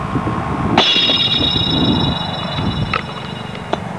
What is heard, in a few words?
This is a recording of a hard drive platter being shot 100 feet into th; ring toss